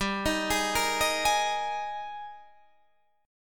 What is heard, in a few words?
Ab Major 7th Suspended 2nd G, Guitar